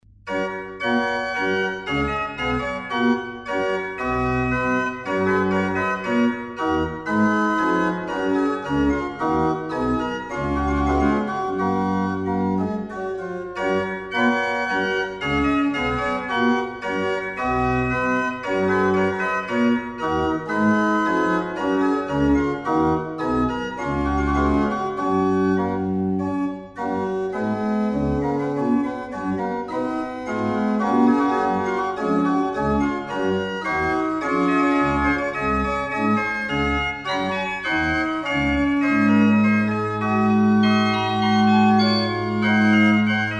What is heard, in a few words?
menuet, akkerorgels